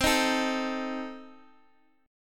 C Minor 6th, C, down, Guitar, Half Step Down

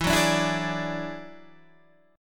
F sharp 7th Suspended 2nd Sharp 5th, E

